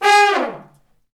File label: BRS, Fat Falls; Roland L-CD702; Fat Section